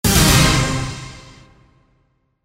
デデデン 逆

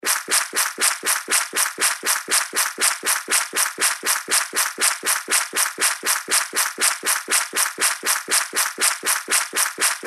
足音 水辺 走る; 足音の効果音

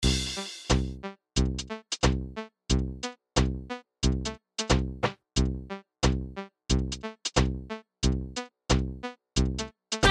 チップチューン スーパー intro 90BPM

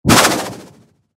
ブーメラン飛ぶ 通常攻撃 モノラル; 武器効果音

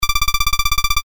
会話

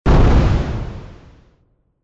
カウントダウン ロング

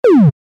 撃つ レトロ; モノラル

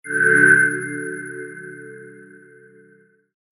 光魔法 小 モノラル

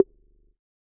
ポン コミカル

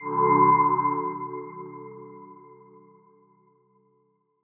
光魔法 中; 魔法効果音

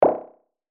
ポンッ 泡がはじける音

